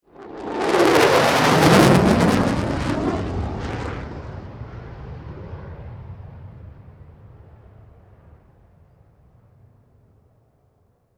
F18 Fly By Fast, Airplane; Vehicles

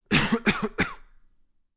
Human, Coughing, Male; Sick

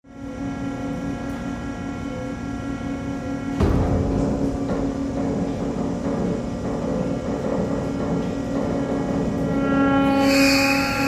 Ship Oil Pipeline Service Vessel Control Room

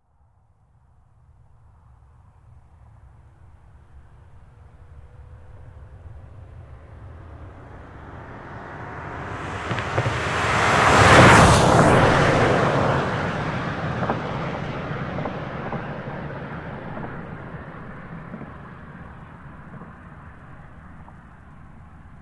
Car Highlander By Very Fast; 2005 Toyota Highlander V6; Cars; Vehicles; Big Room Sound

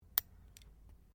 NAIL CLIPPERS CLIPPING TOENAILS; Miscellaneous Human Sound Effects; Miscellaneous Human (Sound Effects:Human)

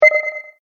multimedia rollover, Multimedia Rollover Tone

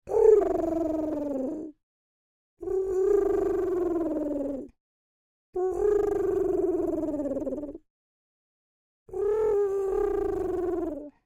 Creature Oodle Bird Calls Resting; Birds; Animals

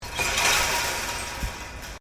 Wire Fence Impact; Material components to create more complex sounds; Hits; Objects

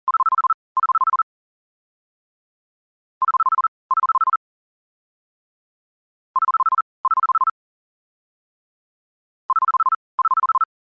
Cell Phone Ring Tone

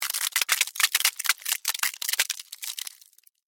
Ice Crack; Weather; Snow; BlastWave

